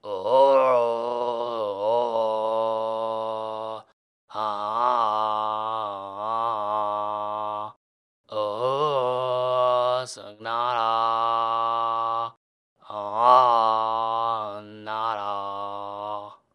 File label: sing